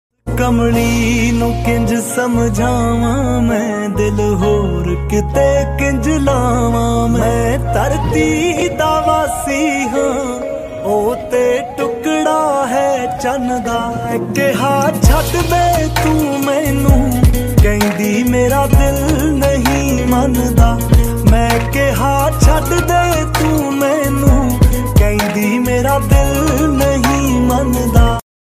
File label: Punjabi Ringtone